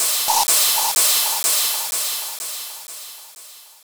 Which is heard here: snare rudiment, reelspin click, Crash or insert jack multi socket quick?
Crash